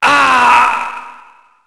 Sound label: death; characters